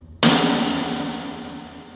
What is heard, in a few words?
Beat; eboard